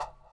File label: PERC; Spectrasonics; Metamorphosis; 3RD MAN